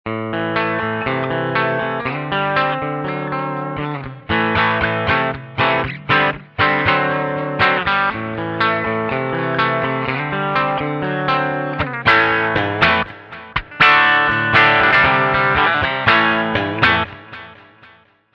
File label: bridge clean; rolling mill2